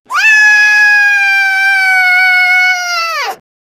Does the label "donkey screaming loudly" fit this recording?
Yes